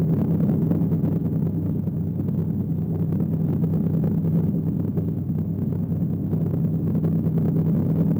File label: Boss Rage Loop; Warframe; Caliber Chicks